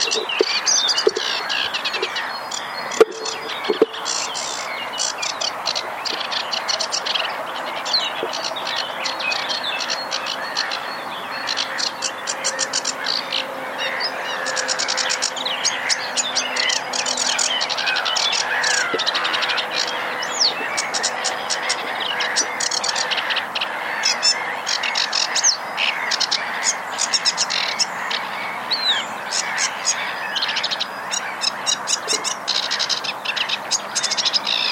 phragmite joncs étang

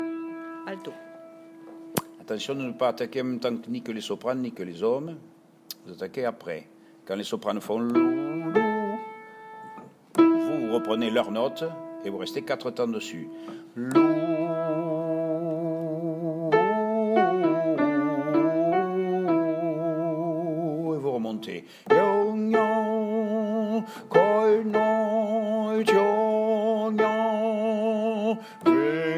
alto

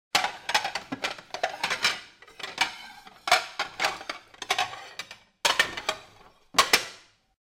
vaisselle

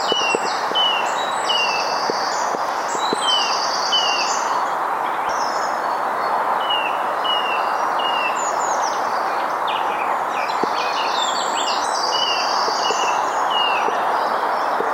sittelle torchepot etang

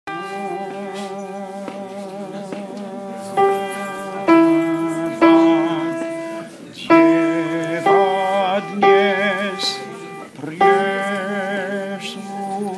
alto

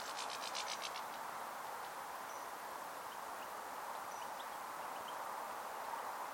chardonnerets autres

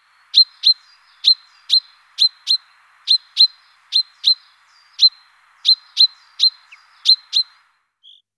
pinson des arbres cris

